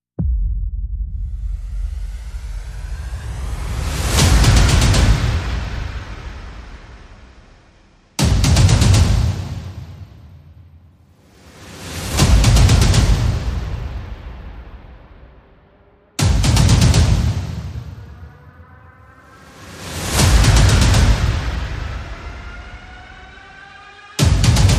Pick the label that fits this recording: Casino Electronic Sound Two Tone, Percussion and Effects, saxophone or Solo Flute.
Percussion and Effects